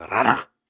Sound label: Grunts and Chants, Viking Vocal